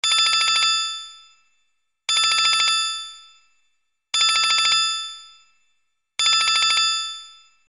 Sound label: Old Phone; cellulari